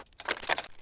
ru-556 retract, weapons

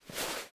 clothes generic foley

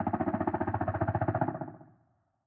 fog shooting

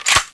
start reload, mosin; tfa cso

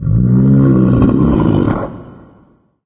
mancubus chatter; doom3

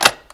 bolt up; weapons

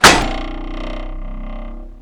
knife metal; cso; tknife

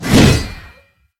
swing horizontal

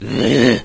zombie sight; doom3

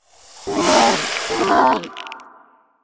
spider misc

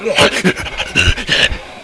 fast zombie alert

